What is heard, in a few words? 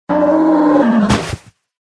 death; zarlog kopeishik